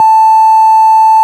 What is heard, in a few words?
Farfisa; Farfisa Clar DBar